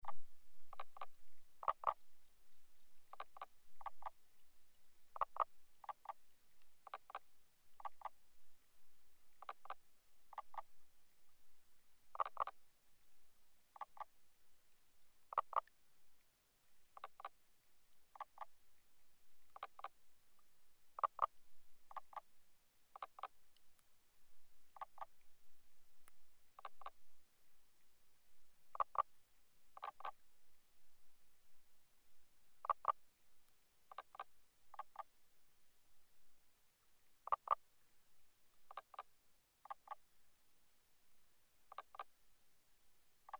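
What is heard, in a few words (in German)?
Knoblauchkröten Rufe am Gewässer aus naher Entfernung, mit Hydrofoneinsatz; bei Holm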